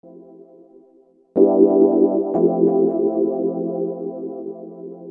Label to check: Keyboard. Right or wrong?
Right